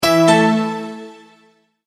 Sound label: multimedia tone verified; Tones; Sound Design; McKinney Sound